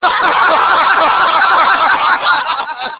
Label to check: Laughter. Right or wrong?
Right